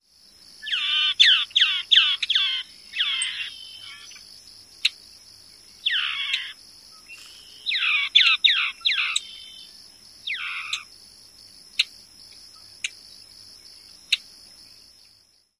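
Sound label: chew calls and chips of pair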